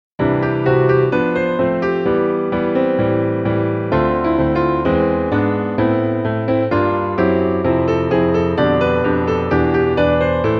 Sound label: piano; VSエキサイトバイク